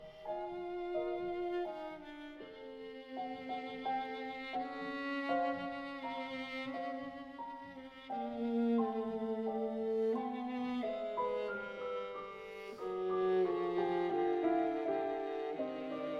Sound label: Shostakovich Viola Sonata C major op 147 I Moderato